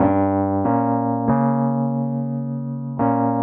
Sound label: jazz keys ElPiano 140 B-line n Riff G